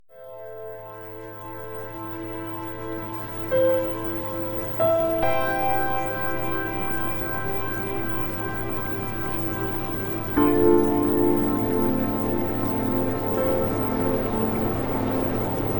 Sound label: Music Theta Waves; OVERCOME PROCRASTINATION; Premium Subliminals